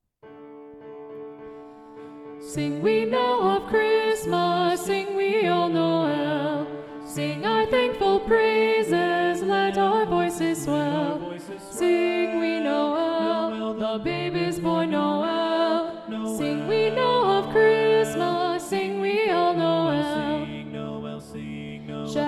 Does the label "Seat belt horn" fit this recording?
No